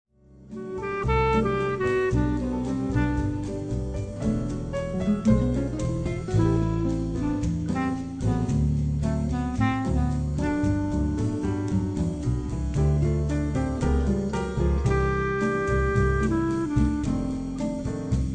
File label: Bossa Nova